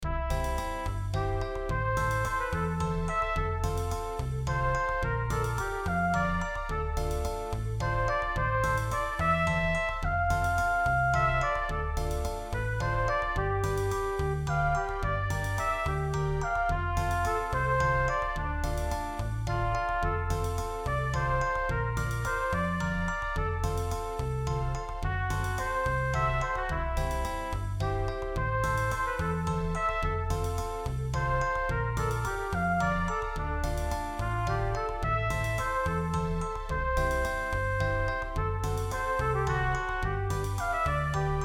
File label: my little trumpet tempo 108